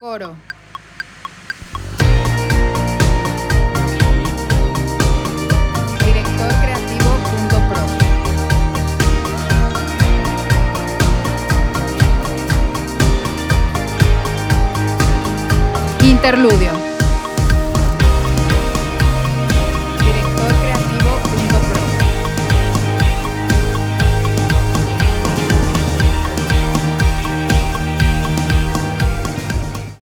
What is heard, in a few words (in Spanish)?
Jesús Ganó, Eb, 120, 4/4